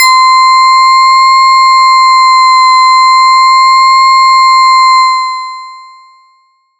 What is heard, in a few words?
SY TANGO; ModelCycles